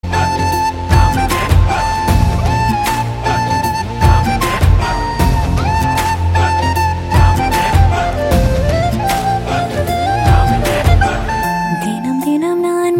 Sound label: Flute Ringtone